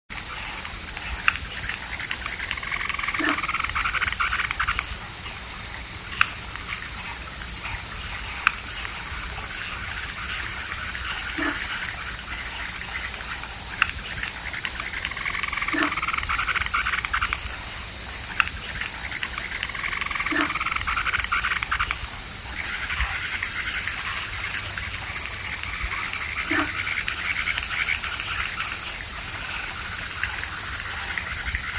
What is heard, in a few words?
brown tree frog